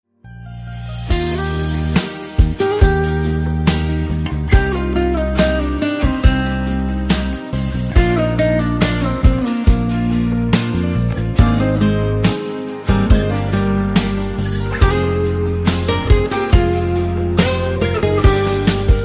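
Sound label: guitar turkey; meloboom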